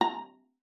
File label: A#5, VIOLA PIZZ; Xsample VOL-5; 90 s Sample CDs